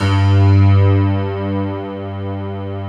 piano pad G2

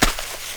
SNEAK DIRT, footsteps; AKAI S-Series CD-ROM Sound Library VOL-6

